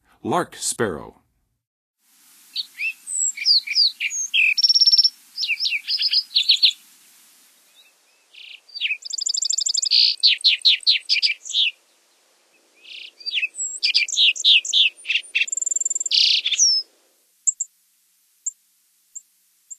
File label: Lark Sparrow, Songs of Birds of North America; Western USA; Western USA Songbirds